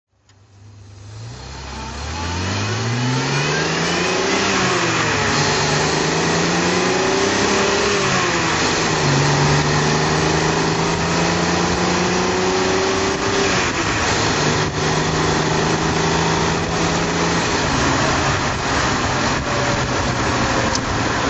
healey 3000 mk1 drive

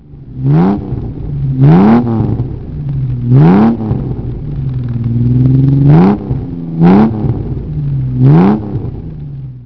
DB6 motor, classic car

